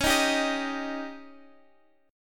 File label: Db Suspended 2nd Flat 5th, Guitar, Standard; x,x,11,8,8,11; strummed